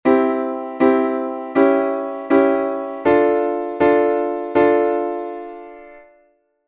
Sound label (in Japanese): C→Cdim→Dm 進行